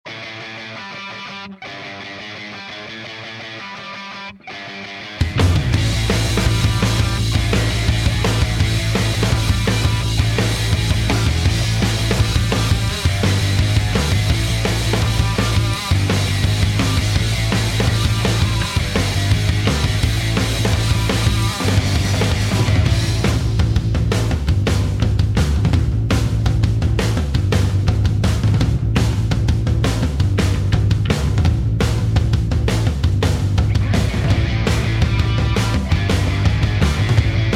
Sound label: Gritty Instrumental; PM USA